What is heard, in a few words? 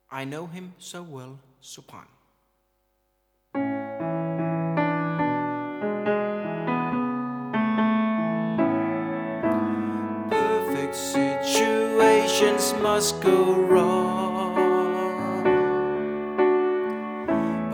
Sopran